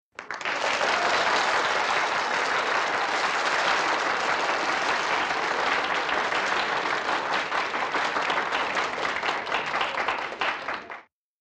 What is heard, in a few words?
Applause Sml Theater